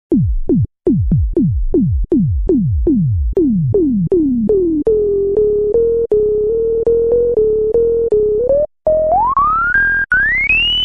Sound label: Voyager Filter